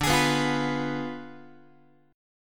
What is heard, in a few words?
D Diminished 7th, D, down, Guitar; x,10,11,9,9,10; Ddim7 strummed